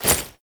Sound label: Generic Foley; Warframe; Uriel